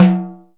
percussion, Ethnic Perc

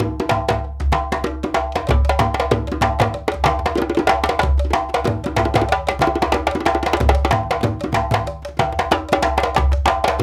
Lamba, African Contemporary; Spectrasonics; Supreme Beats

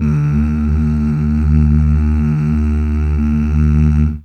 Mmh Male, CH BACK

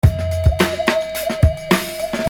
Beat, Hip-Hop Street Beats

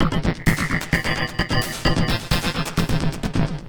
Beat, Industrial

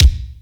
KICK, GATE KICK

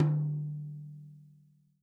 TOM, Real Drum Kit

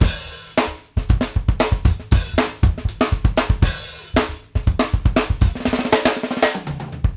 7 8 + FILL, Drumworks; Sampleheads - New York City Drumworks VOL-1

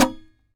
SAZ TAP; Spectrasonics; Hans Zimmer Guitars VOL-2

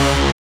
SYN TECKO, Dance Synth; Sound & Vision - Gigapack I CD 2

